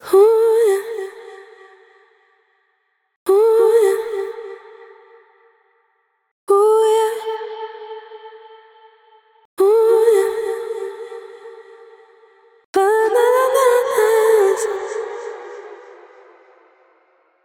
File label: delay; valhalladelay; Delay-Plugins